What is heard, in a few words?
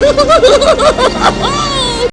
laugh